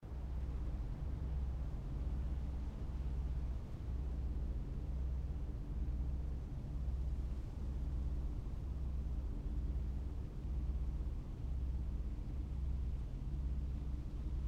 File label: AMB Misty Lands; MACenter